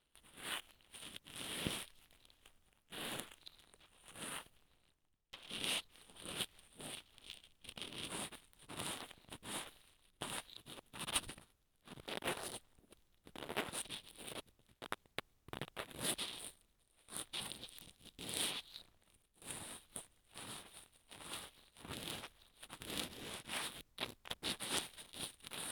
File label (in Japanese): 耳かき R（摩耗紙ヤスリ＋乾燥綿棒）; 夏実の耳かき